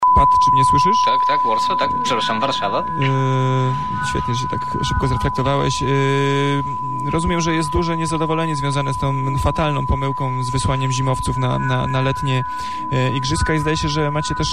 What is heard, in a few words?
radio 1khz; sygnaly testujace